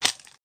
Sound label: slime; water splash slime sfx